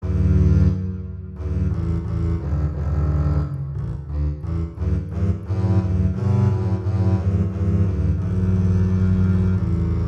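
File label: Duet, horn; Mozart Duets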